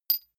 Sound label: medium metal impact medium high